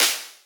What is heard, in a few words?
Drop Clap; FLPRO